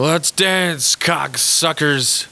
drunk

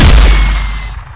gat fire

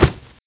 body drop; diekrypta

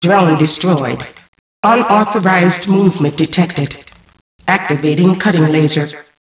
cutting, mainframe

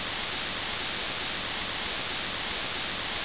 gas explode

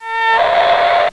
sawrunner attack

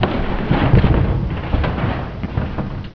debris

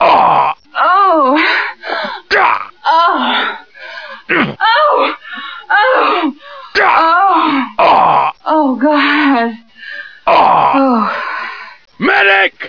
blow

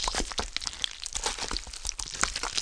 gun idle, weapons; hgun

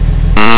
train; plats

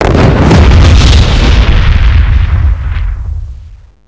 c4 explode

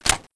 mp5 grenade launcher

